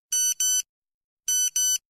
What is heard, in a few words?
Old Nokia Message